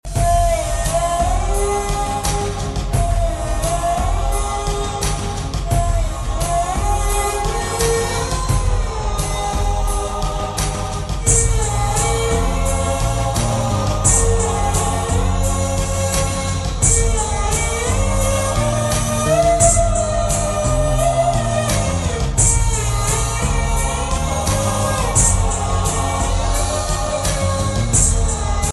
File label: Violin Ring Tone